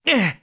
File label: Roll Clash; rolling into a wall; Link; Skyward Sword; zelda